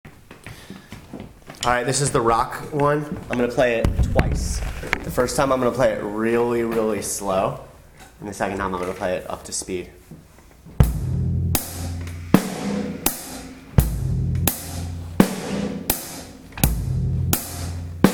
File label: Rock